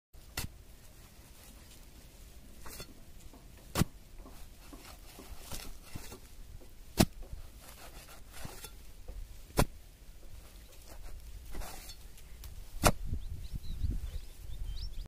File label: plowing soil